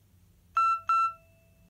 SMS ringtone

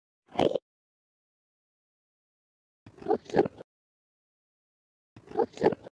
Endermen; ماینکرافت

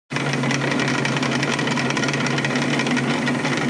conveyor belt

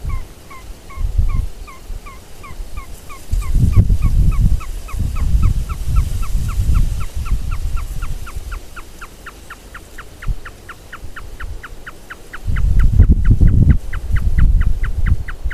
Marsh tapaculo

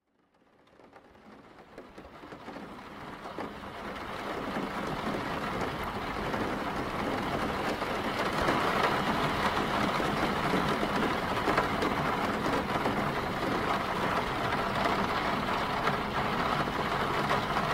Rain inside car

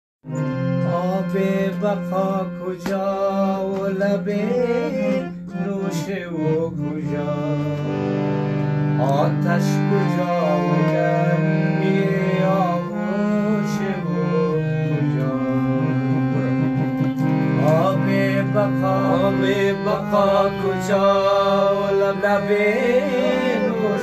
Harmonium

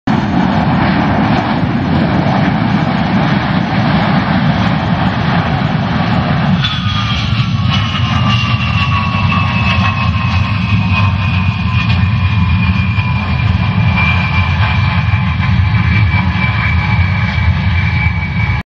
Ilyushin Il 62

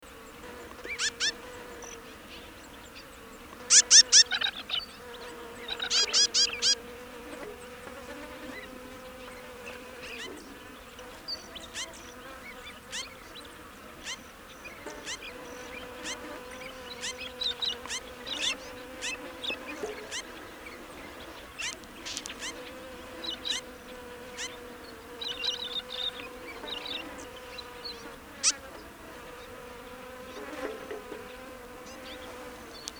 Orange chat

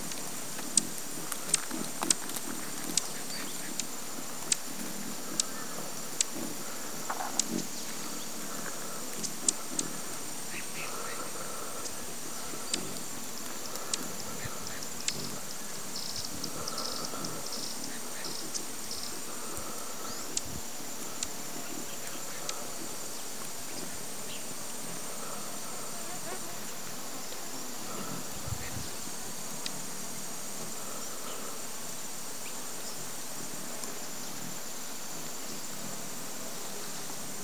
Horned Sungem, پرنده

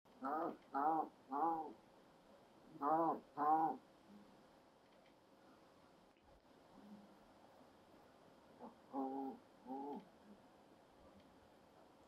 pallas's cat

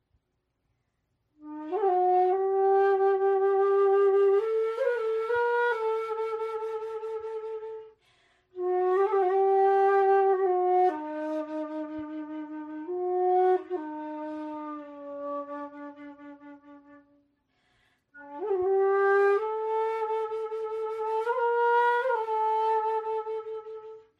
Arabic flute; فلوت عربی

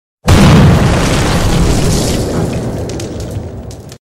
Carbide; کاربیت

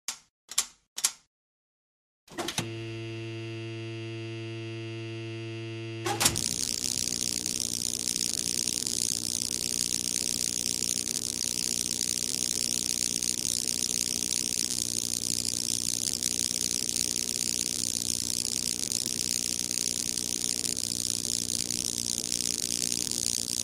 Electric medical chair

